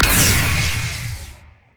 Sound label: shock blast; LoL; jayce